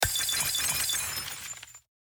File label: glass break